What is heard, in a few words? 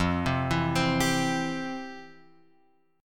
F Major 7th Suspended 2nd, guitar chord; FM7sus2 Chord {1 3 2 X 1 3} arpeggiated